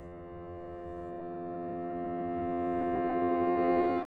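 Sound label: piano Room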